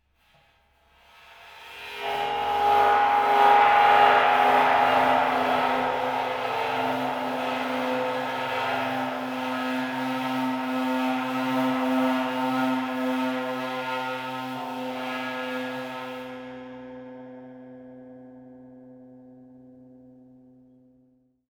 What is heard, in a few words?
multiphonic to pitch, bowed cymbals